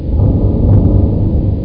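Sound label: big heart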